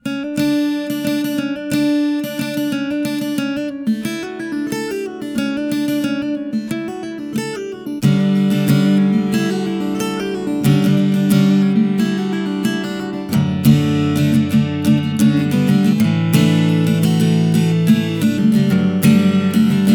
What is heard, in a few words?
Strum